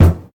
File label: good kick drum f key; kick drum samples